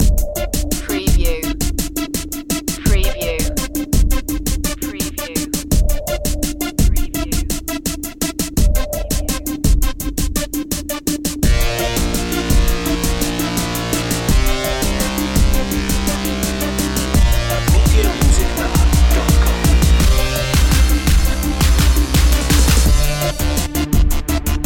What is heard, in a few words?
dark synth long looping